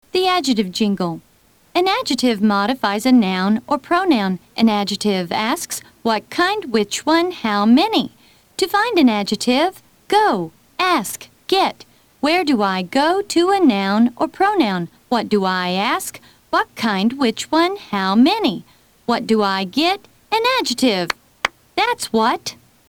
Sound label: adjective jingle